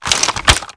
nutcracker spawn; lethalcompany; zerosiki; slender

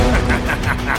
laugh; freak fortress 2; armstrong

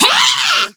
shout short; scarlet; slender

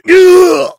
heavy pain severe

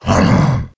attack, male zombie; nmrih; zerosiki; slender

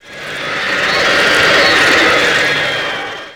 spider chase sound; granny; zerosiki; slender

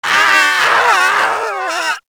kid zombie death; nmrih; childrenofthedead; zerosiki; slender

